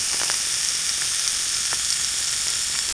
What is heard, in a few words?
meat sizzling on a frying; appetizing